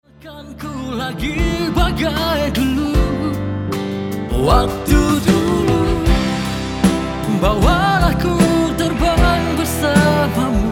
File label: Pop Rock